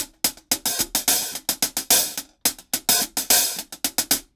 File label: hat busy rhythm 110bpm